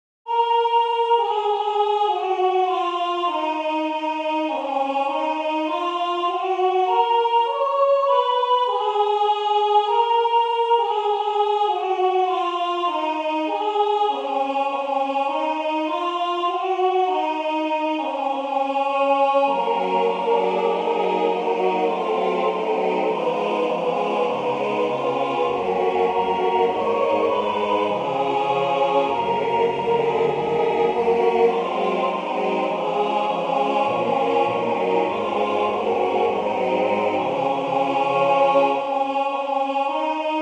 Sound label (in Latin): Ave verum corpus Tutti; E.Elgar